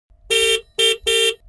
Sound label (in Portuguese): Buzina rapida